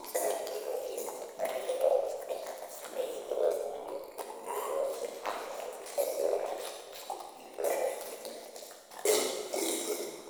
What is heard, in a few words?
Infested Eris Interior Critter Loop